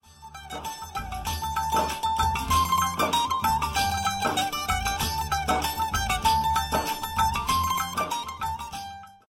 Instrume

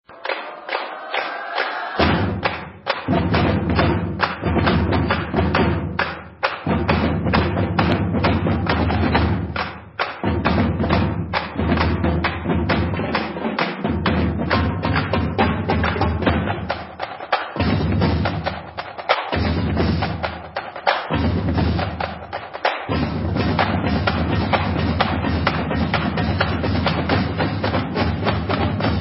Drumline

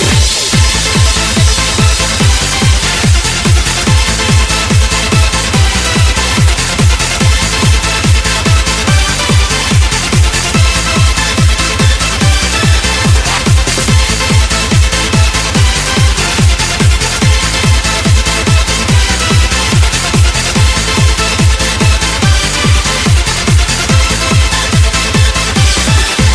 Trance

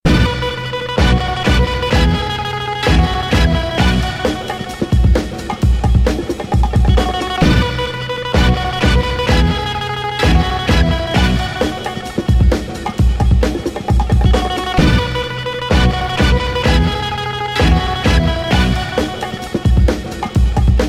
Funky Fury

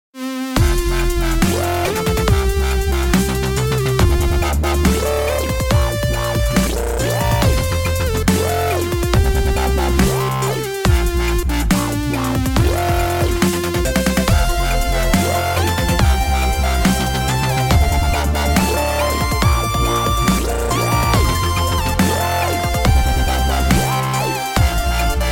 Auld Lang Syne Dub

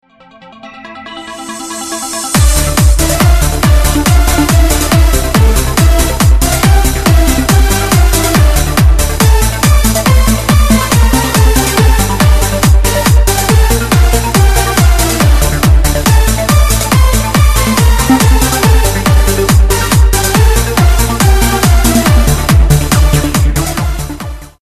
Dubstep

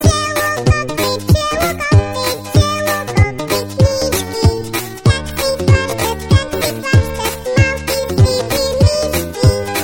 beach party

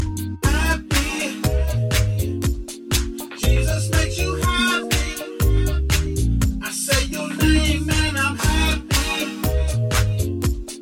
happy gospel house

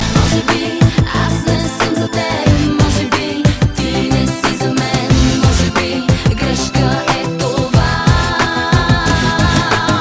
Acoustic

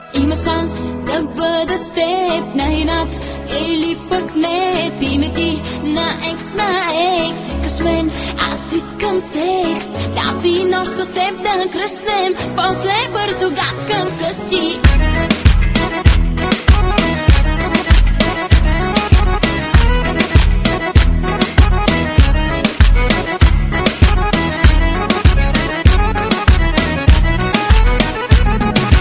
Jazz

